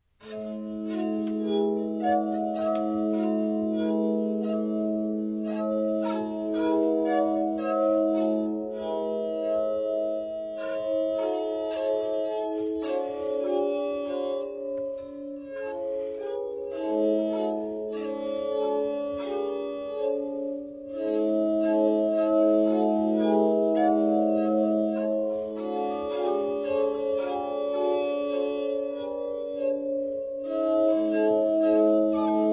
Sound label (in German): glasharmonika